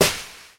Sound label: household bean bag kick; sound effects one